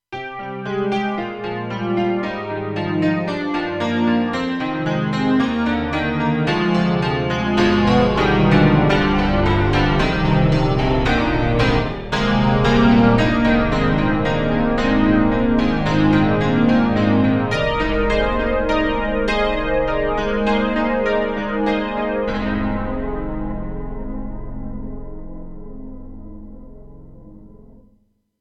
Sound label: KORG X5DR Piano; PianoHaven